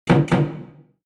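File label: door knock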